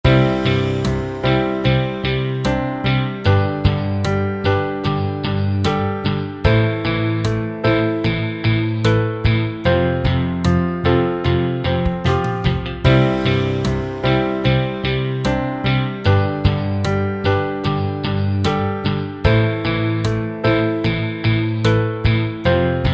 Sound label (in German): viererbande 75bpm